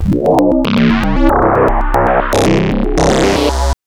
Synth FM Pad c0; TrashLibrary; FMCycesPad

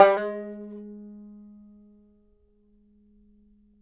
BANJO NOTES, AB3; Sampleheads; Whole Lotta Country 1 & 2

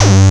Industrial Analog Single Notes Short; Sonic Foundry (Sony Creative Software)

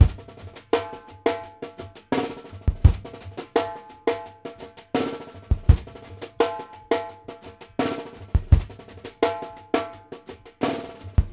NITTY GRIT R, New York City Drumworks, BR+STK 84; Sampleheads; New York City Drumworks VOL-1

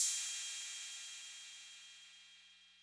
Cymbal Mid Decay, 808

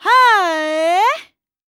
VOICE, EASTERN; ILIO; Vocal Planet

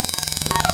Crash Gtr, wavetables; Animoog

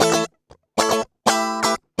120 BPM

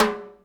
Hi W Verb, Alesis D4 drum machine

